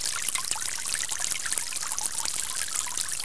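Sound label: fountain small; Star Wars Knights Of The Old Republic II; XBOX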